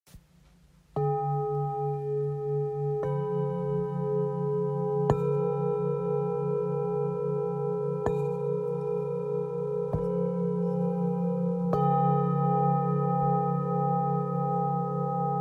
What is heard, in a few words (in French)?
Set 3 bols bengale fullmoon galaxie